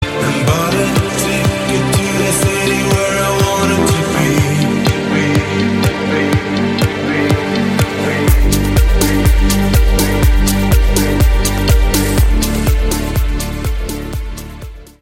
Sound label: EDM; Barcelona